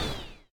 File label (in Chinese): 恼鬼受伤声